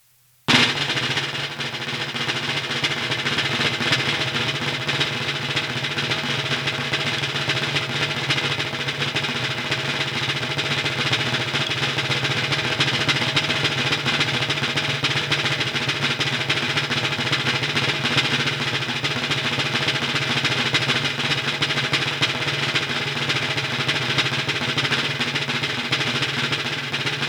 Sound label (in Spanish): Redoble